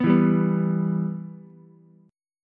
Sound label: B Suspended 4th Sharp 5th B Ukulele; Bsus4#5 strummed; Bsus4#5 chord; JGuitar